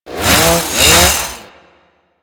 Ghoul Sawman Crazy Swipes, Attacks; Warframe; Grineer Ghouls

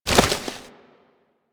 Hoverboard Land; Warframe; Vehicles; Venus; anim sounds

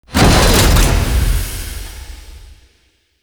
Galleon Platform Stop; Warframe; Grineer Galleon; Vor Galleon Fight

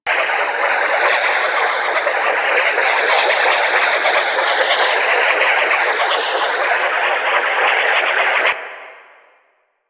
Haze Loop; Ambience; Props; Warframe; Corpus Railjack

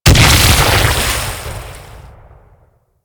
Pirate Cannon Explode; Warframe; Warframes

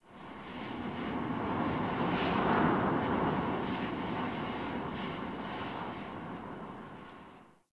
Under City Wind Heavy Slow Gust

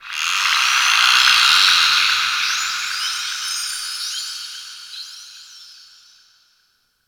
Ocean Dolphin High; Warframe; Grineer Ocean

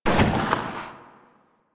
Revolver Pistol Tail

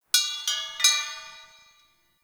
Chimes C, Ambience; Midrath Island; Fey Hub

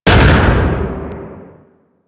Death Orb Pound, Traps; Warframe; Orokin

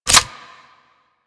Reload Dual Pistols; Grn Queen Guard Dual Pistols

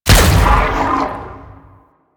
Rail Jack Rifle Secondary Shot; Warframe; Tenno

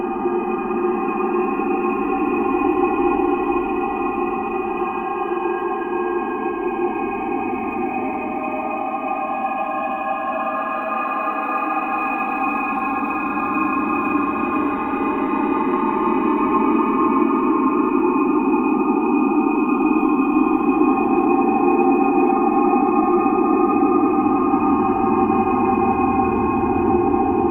Space Exterior Amb; Warframe

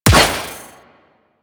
Pistol Impact Generic; Sentient; Crp Sent Amalg Pistol

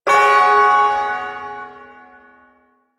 Ersatz Shawzin Yo Chord; Warframe; Duviri Ersatz Shawzin

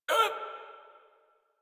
Pain, Barks, Battle; Warframe; Baro Defense

